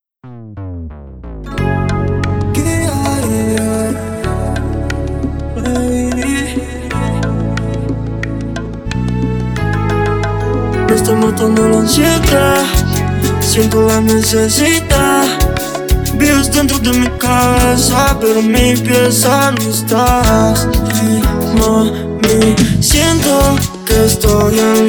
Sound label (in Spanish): Versión Cumbia